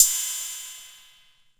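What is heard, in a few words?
TR 606, drum kit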